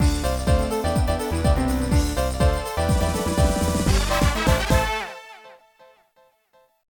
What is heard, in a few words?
Win; Street Fighter III Double Impact; dreamcast